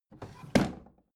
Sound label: bathroom drawer open regular